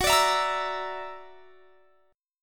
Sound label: Gb Augmented 7th Gb down; Listen to Gb+7 strummed